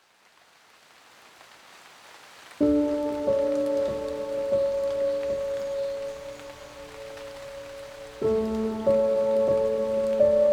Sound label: piano rain sounds